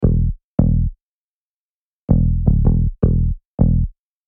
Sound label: Stinger